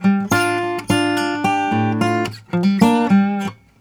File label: Blues Guitar; ILIO; Fretworks; LICK